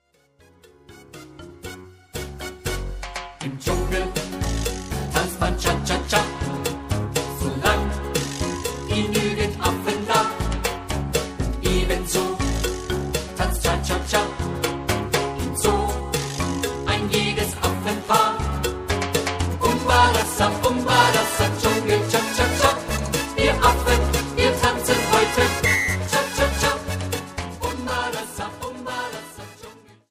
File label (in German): Dschungel Cha Cha Cha